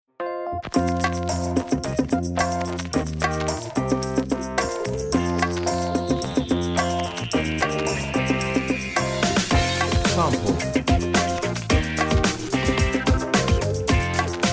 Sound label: beat